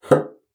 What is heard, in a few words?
BASSOON; Xsample VOL-6